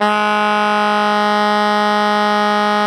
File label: Soprano G#2, Saxophones; Greytsounds; Philip Wolfe David Crozier's Saxophones